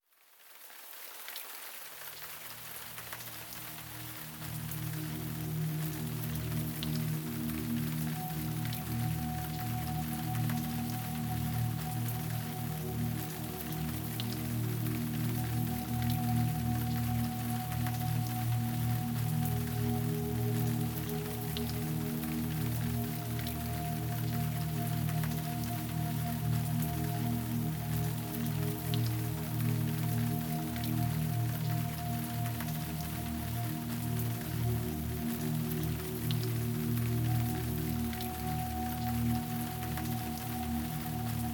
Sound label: Rain Sounds